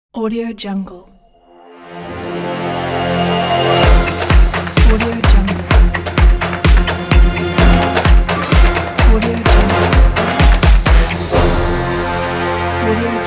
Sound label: epic hybrid orchestral logo